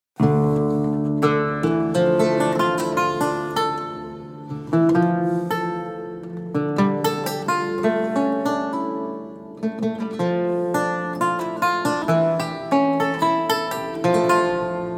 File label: Prelude